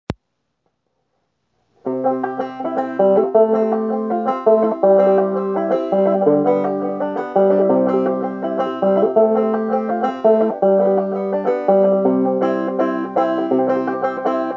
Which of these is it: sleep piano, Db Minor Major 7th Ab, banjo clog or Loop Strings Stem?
banjo clog